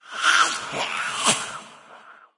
idle, cherub; doom3

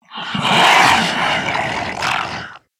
attack, zomb female; nmr

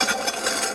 metal drag

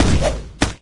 ceiling attack no vo, wraith; doom3

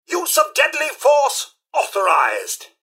attack; nukarobots; mrhandy

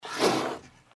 gecko claw atk vox; npc

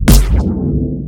charge hit

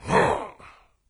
melee; doom3; commando

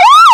siren, police

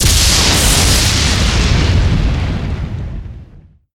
lightning stake impact

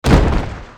land

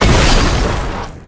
thud

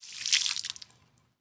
body bloodspout; flinder

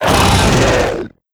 death

